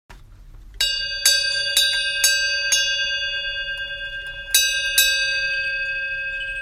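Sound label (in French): Bronze moyenne, Cloche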